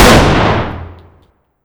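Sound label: fire distant 338lm rifle interior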